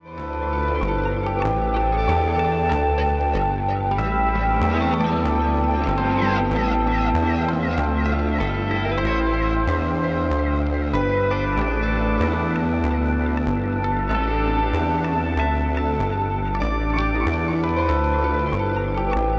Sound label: loop